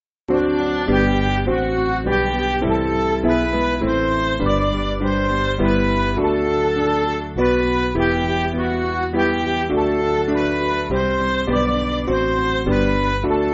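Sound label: Midi, Music Backing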